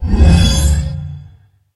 guardian portal

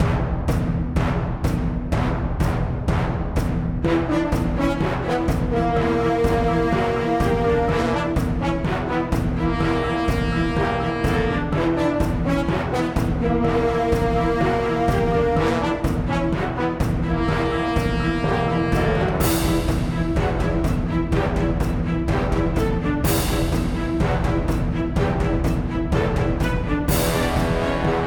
bgm infinity mode battle

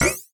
ui click lock

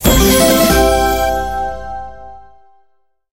clearbonus item

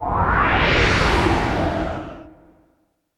port pass

